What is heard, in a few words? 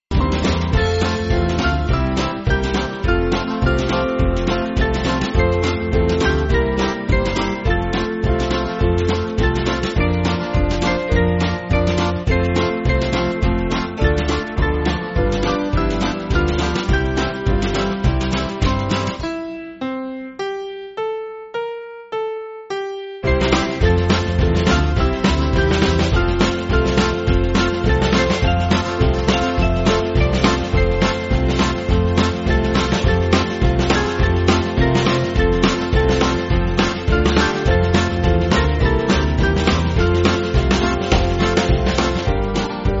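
Band, Music Backing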